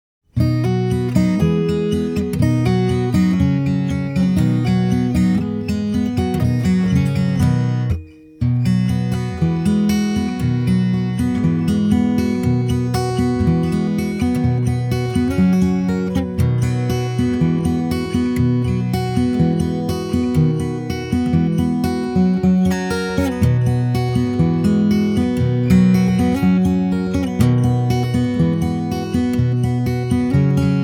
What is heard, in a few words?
Backing; jardim infancia